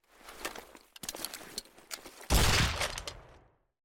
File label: pistol small